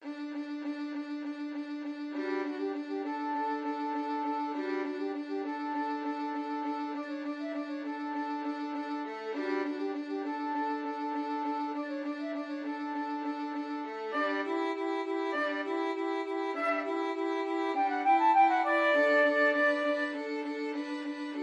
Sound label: high violin flute duet; parkgate